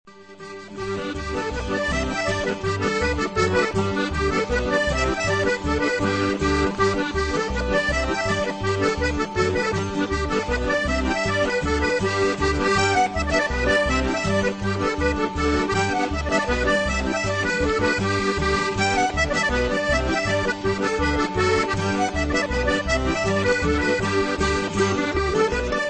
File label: accordion polkas